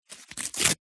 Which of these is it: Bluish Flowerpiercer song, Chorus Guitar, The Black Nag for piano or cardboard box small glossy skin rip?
cardboard box small glossy skin rip